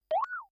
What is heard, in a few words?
high up; Kenney's Sound Pack; Digital Audio